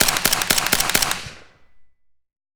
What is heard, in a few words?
Enfield L85 rifle 5.56mm on gun Five shots; DPA4062